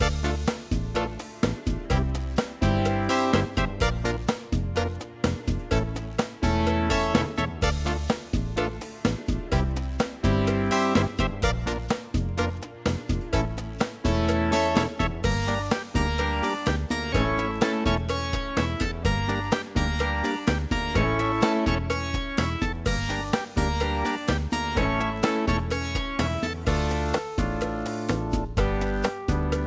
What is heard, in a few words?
Pixel Cha Cha, chiptunes